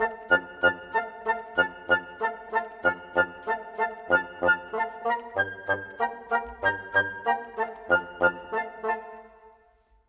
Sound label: Bassoon Flute, Orchestral; Sonic Foundry (Sony Creative Software); Orchestral VOL-2; Modern 29